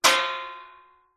industrial spring small under tension strum resonate